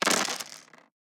DICE on Plastic, Throw and Roll, Standard, 16 Sixteen Dice; Samples; ArticulatedSounds; DICE Sfx Party (Extended)